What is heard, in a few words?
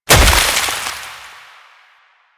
Proc Cold End; Mods